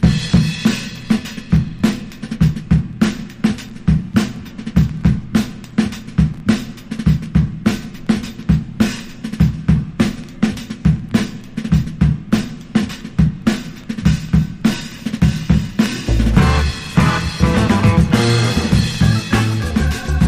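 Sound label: INSTRUMENTAL VERSION, jazz rock; mod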